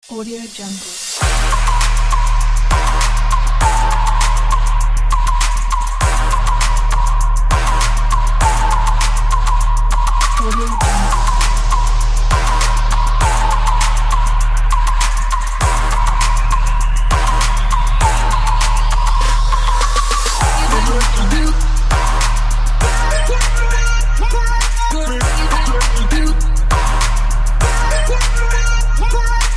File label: trap